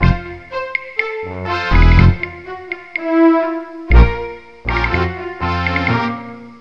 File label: tango